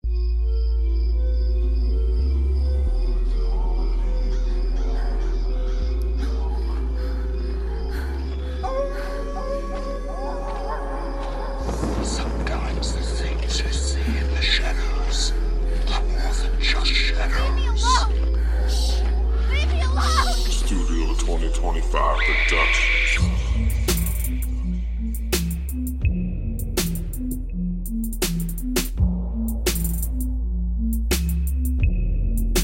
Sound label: lo key instrumental intro verse